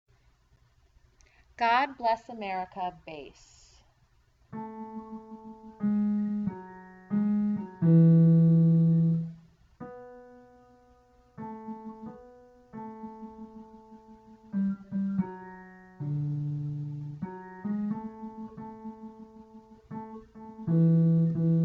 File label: bass